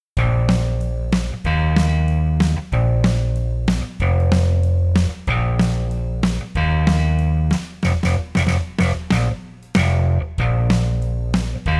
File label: classic rick bass